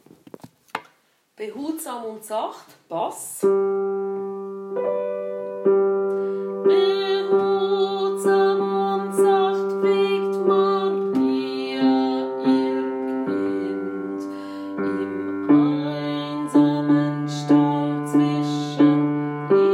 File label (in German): Bass; Behutsam und sacht